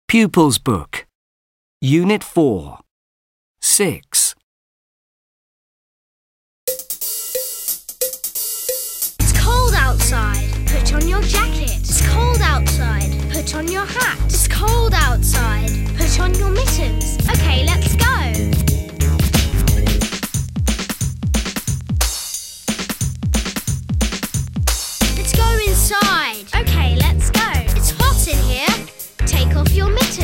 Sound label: Cold and hot Chant